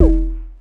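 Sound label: buoy hit, impact; Splashdown; XBOX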